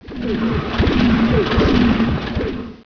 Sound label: refinery; StarCraft v2.2.2; terran units